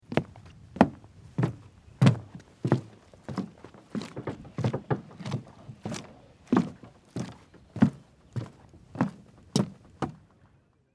Footsteps Boat Dock Walk Footsteps Short Distant Birds